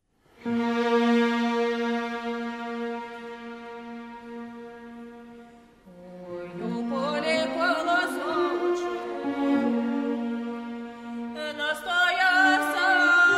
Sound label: Harvest Song; Zhnivo